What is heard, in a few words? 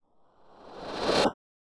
reverse; umbra drums legacy; drum collection; nova sound